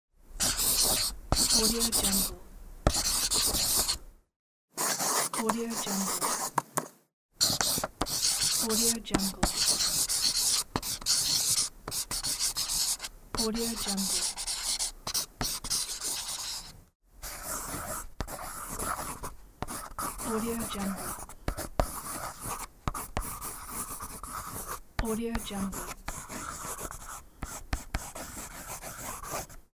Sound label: pencil